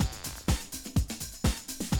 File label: 125, Classic Drum Loops